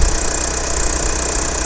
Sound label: skoda octavia, Vehicles